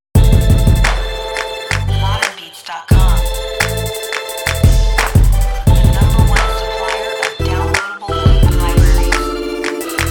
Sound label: Xtreme Synth Loops, synth loops; bibliothèque d'échantillons de synthés; ModernBeats